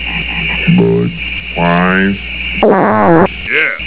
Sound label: fart; Fartweiser; Remember the Budweiser Frogs? Sounds like one of them has a gas problem